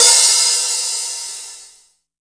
HH, Drum Dry; East Collexion; Drum 1 Dry

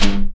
SYN SHORT, dance synth; Sound & Vision - Gigapack I CD 2 (Roland)

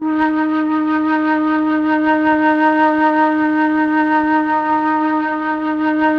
MELLOTROF

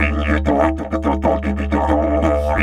Didge 93 BPM, Didgeridoo; Big Fish Audio - Didgeridoo and Other Primitive Instruments

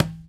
CONGA TIP; Conga Battery; E-MU Formula 4000 Series Vol. 4; Earth Tones

